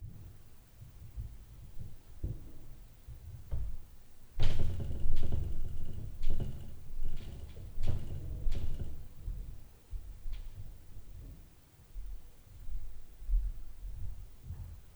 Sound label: LF UPSTAIR NOISE, Night Noise